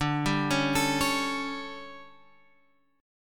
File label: D Major 7th Suspended 4th, Guitar, Open G